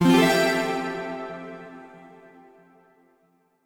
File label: Clear C0 Echo; Mixolumia; Tessellation